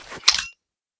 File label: echo quickdraw, detectors; STALKER; IWP